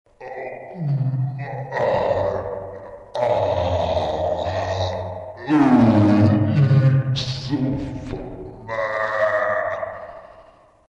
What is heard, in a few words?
Ghost